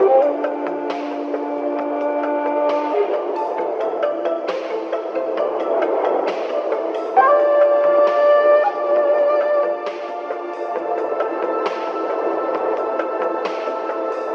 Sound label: flute and keys, Loops, Phonky